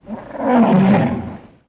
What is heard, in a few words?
Godzilla Noise